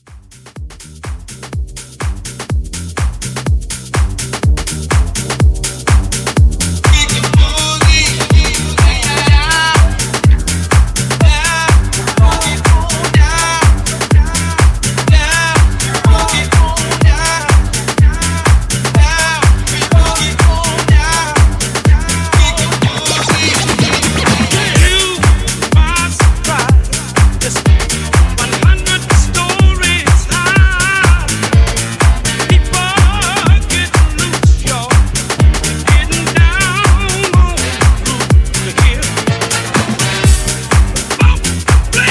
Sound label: House Classic Blend 124